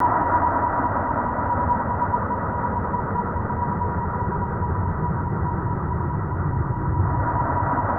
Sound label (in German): MASCHI; Best Service; Advanced Mega Effects